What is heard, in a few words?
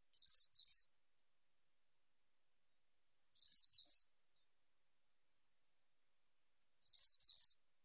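yellow wagtail voice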